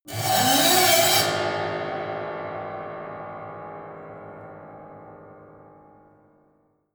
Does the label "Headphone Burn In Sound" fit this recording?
No